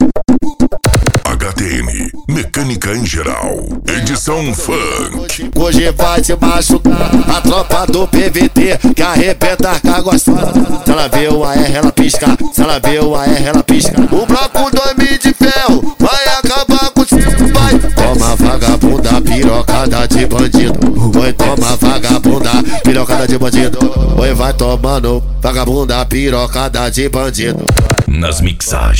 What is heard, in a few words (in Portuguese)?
mecanica em geral edicao funk